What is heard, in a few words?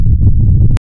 pulse of a spaceship engine